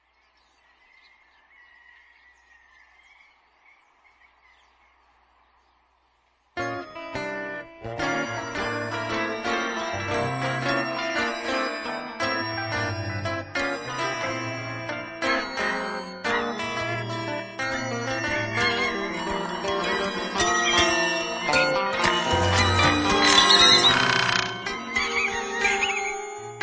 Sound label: Licks electronics